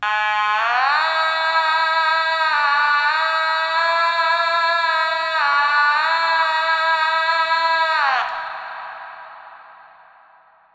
Otamatone simple Melodie mit Reverb

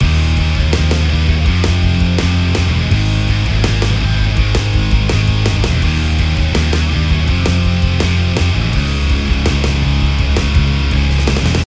Rock ungemastert

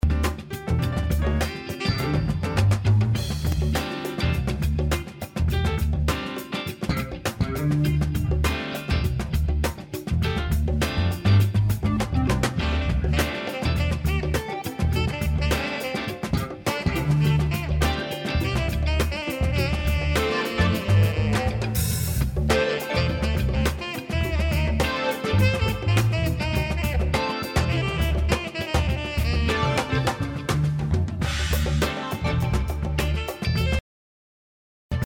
Beispiel Perc gate

